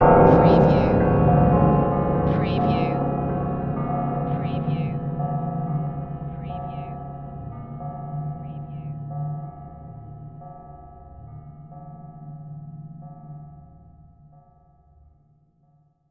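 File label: Production Element Hit Chimes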